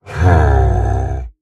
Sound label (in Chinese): 待机声; 劫掠兽